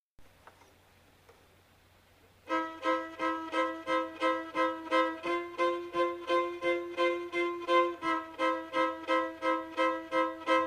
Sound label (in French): accompagnement doubles cordes, violon